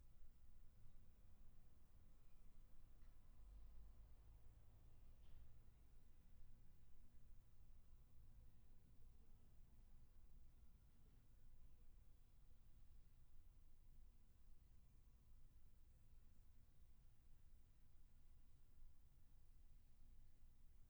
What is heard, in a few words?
room tone